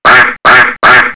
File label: ducks fight; Pinocchio 1995 SNES; gamesoundopedia